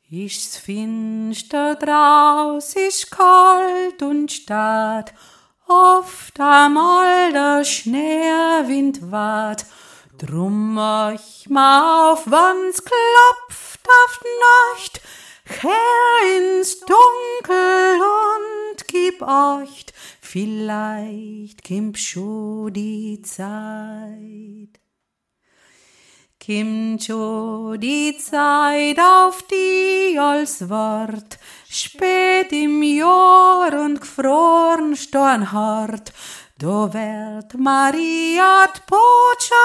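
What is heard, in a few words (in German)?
is finster drau alpenländisches weihnachtslied hohe stime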